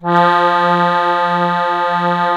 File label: HOHNER ALPIN, akkordeon; Best Service; Alpine Volksmusik